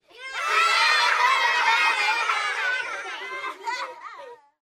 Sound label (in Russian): smeh tolpy